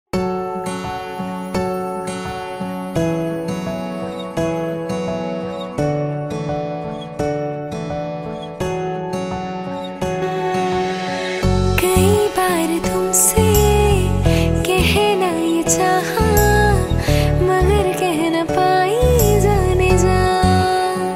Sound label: Female Version